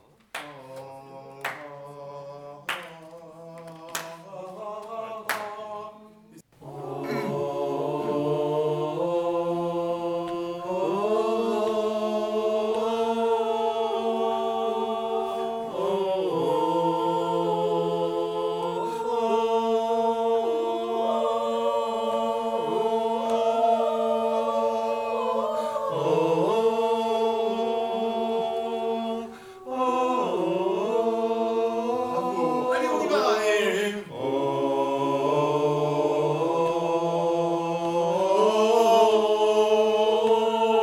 Sopranes et Ténors, avec le rytme ténor au démarrage

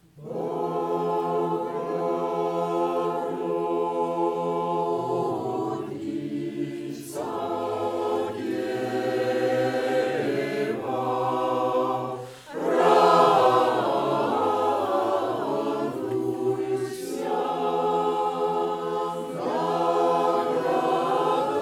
Mezzo, Alti et Ténors, Chorale; Mesures 1-9; Les Vêpres

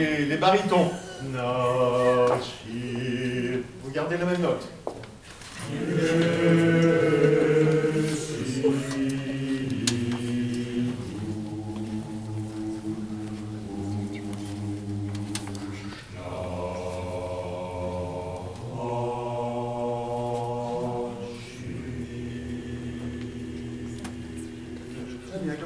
Basses, les barytons gardent la meme note, Chorale; Les Vêpres

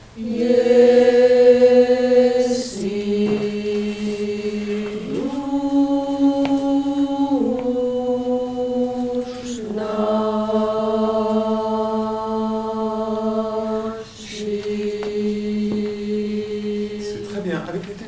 Alti, Chorale; Mesures 25-28; Les Vêpres

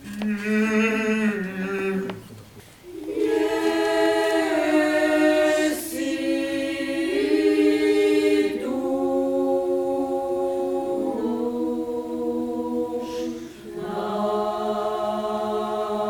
Sopranes et Mezzo, Chorale; Les Vêpres

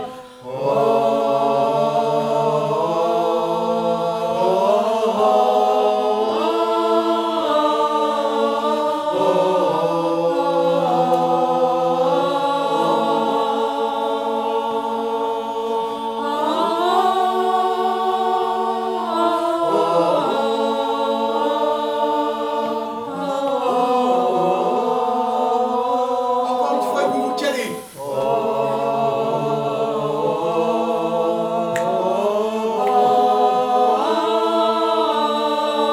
Alti et Ténors

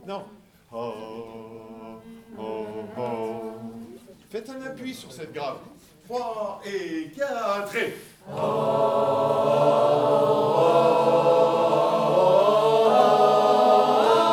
Alti, Ténors et Basses, alti appui au démarrage

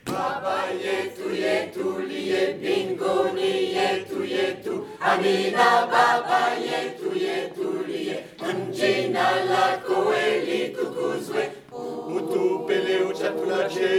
tutti 2 fois, Chorale; Mesures 9-20; Chorale Passages - Corenc

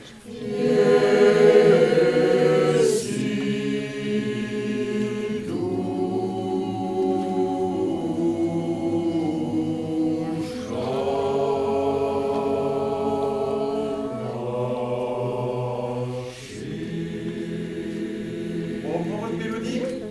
Ténors, Basses et Alti; Les Vêpres

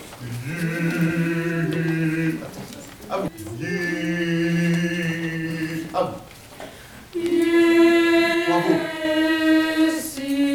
Mezzo, Chorale; Les Vêpres

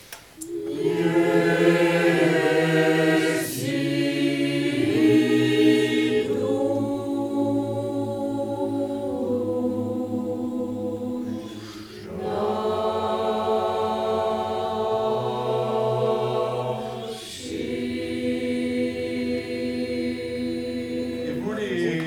Sopranes, Mezzo et Basses, ie à la russe; Les Vêpres

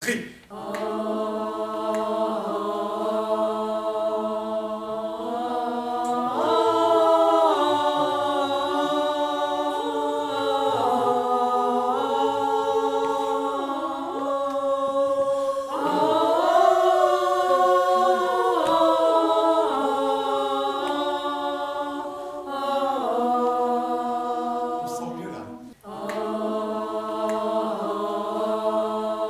Sopranes et Alti; Mesures 1-12

